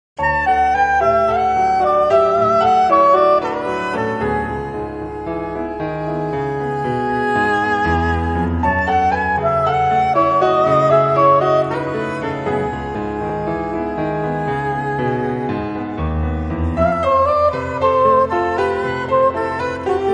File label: jazz brazilian nights, bossa nova; sampler bossa nova